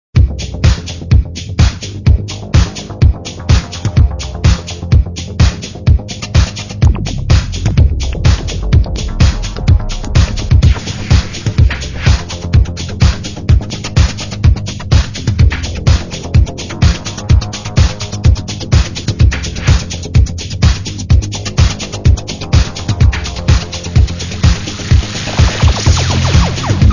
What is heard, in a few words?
Techno